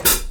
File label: hat, Live Snares; PlatinumPhattCD1; E-MU Producer Series Vol. 8 – Platinum Phatt